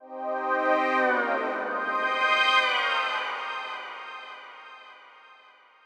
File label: Stabz